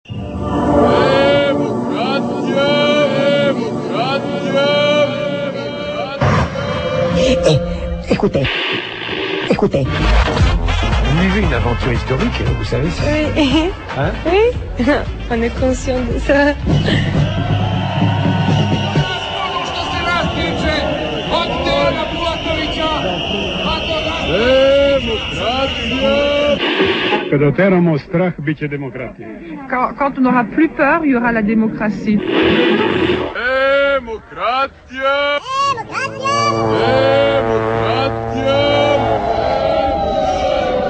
Manif Belgrade ambiance

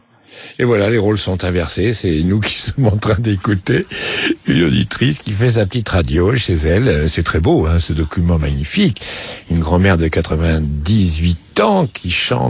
Chants 1er Mai

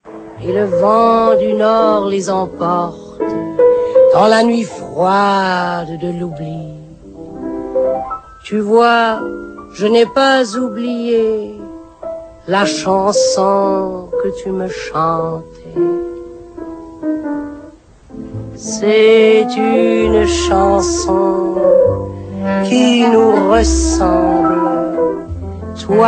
Chanson

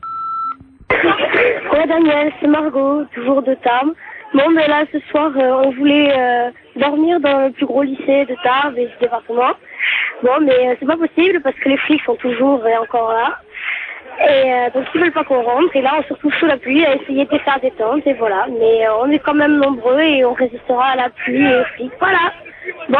Manif lyceenne, repondeur

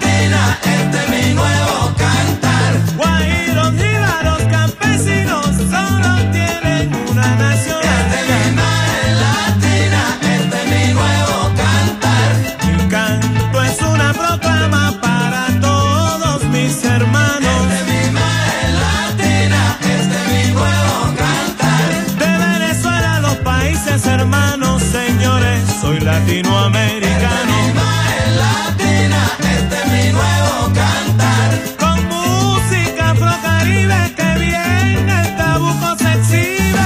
Chanson latino

